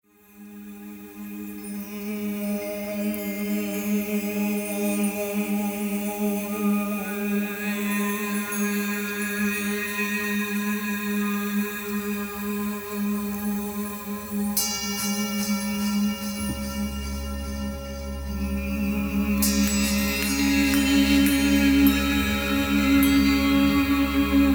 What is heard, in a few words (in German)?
Musik